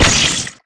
fire, hornetgun

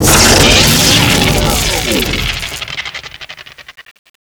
invulnerable on

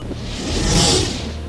electric field

